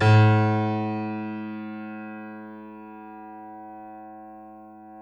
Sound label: C7 F, The Ultimate Piano Collection; East West